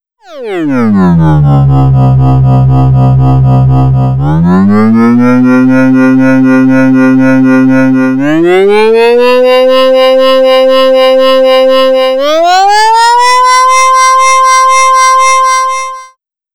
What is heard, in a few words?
programmable wavetable